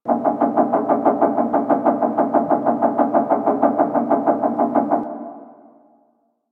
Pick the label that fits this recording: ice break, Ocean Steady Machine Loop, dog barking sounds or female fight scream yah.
Ocean Steady Machine Loop